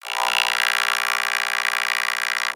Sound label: robot scream; Screaming for cyborgs; tbd-station-14; Machines